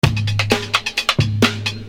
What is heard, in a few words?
Beat, Hip-Hop Street Beats